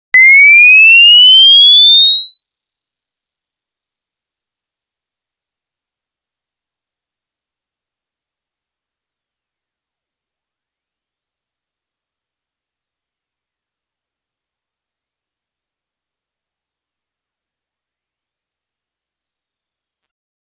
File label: 2 khz 20 khz